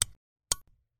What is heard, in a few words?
lightswitch